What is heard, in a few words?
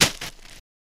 Slam Bag Ice On Tile Su